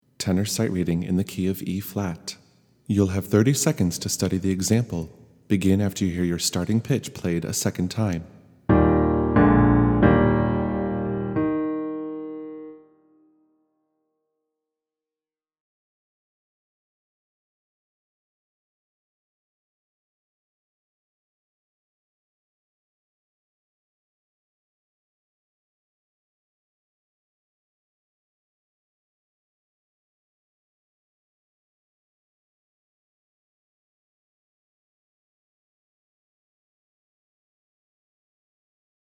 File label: Tenor in Eb